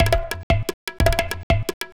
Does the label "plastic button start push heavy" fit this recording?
No